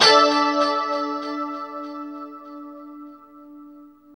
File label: 2CHD D 5 -L, Guitars; Spectrasonics; Hans Zimmer Guitars VOL-1; JC-SHEEN